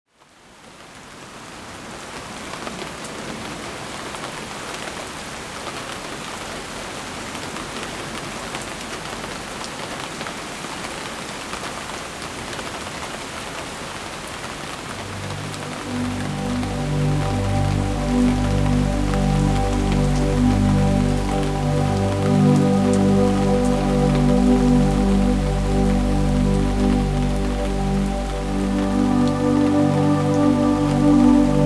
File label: Quiet Rain